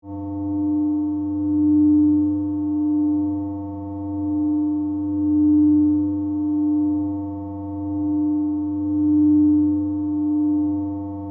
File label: Energy Drone, Scifi, Energy, Drone, Modulation, Power, Source; Science, Fiction